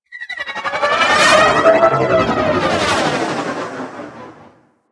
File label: Fighter Ship Attack Maneuver, Engines; Warframe; DE; Vehicles; RailJack; RailJackEnemyShips; Grineer; EnginesGeneric; Maneuvers